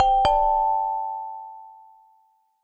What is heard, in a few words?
alert chime